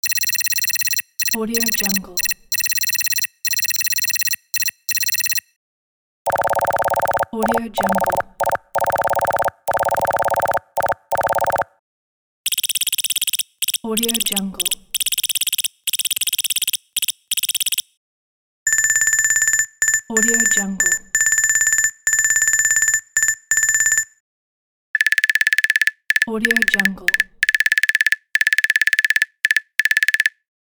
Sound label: rapid computer digital text